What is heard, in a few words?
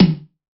tom wavey